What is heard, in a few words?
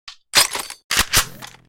ak47 reload; fps3d